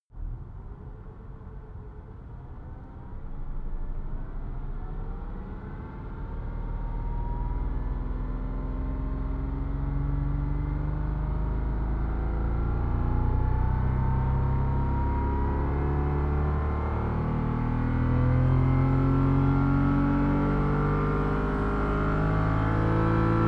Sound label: sports car porsche boxster accelerate slow interior; verkehr transport; autos